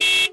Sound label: horn, car; Cars; XBOX